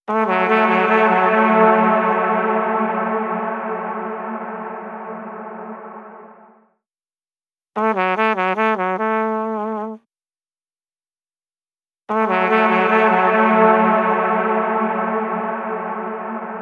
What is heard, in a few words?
Trumpet Flashback Trails; Stereo; Blackhole Immersive